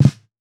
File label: Snare Lo-Fi